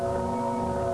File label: Motor, Train; OpenBVE